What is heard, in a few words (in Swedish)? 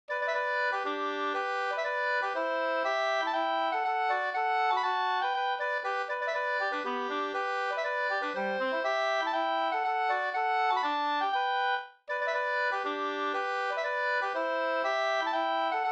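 polska, tvåstämmig; Var har du varit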